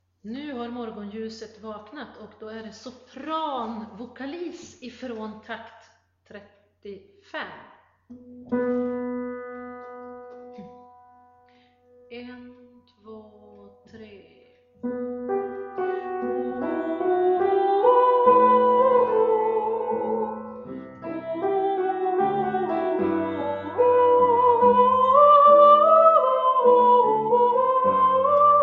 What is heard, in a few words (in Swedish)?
sopran